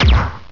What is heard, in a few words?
Dizzy Punch; PokeRogue; battle anims